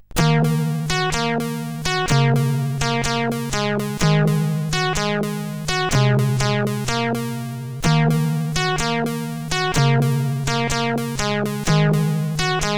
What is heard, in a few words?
Music intro, CSG8580R5; sid